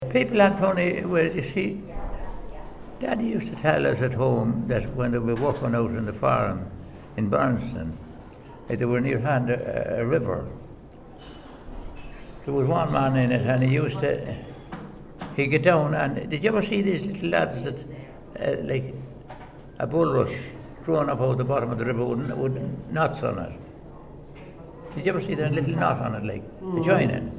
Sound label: Making Whistle from Bullrush; 2007 Interview; Having the Tea